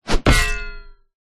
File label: warfare weapon heavy axe swing hit body against wood clang impact squench; zapsplat